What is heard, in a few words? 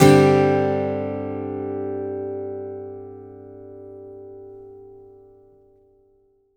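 acoustic guitar G sus up, Whole Lotta Country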